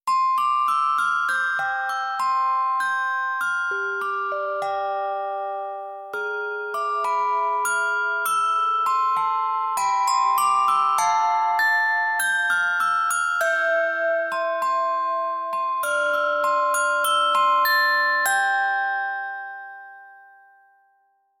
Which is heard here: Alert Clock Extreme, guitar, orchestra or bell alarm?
bell alarm